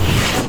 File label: default move, doors; Dystopia